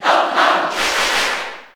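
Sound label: Cheer Japanese, crowd; Rockman; SSB4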